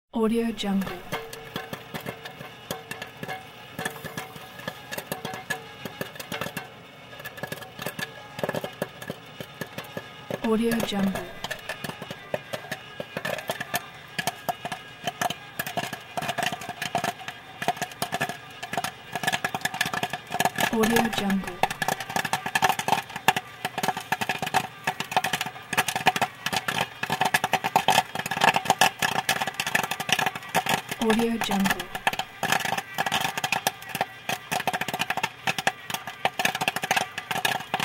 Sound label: popcorn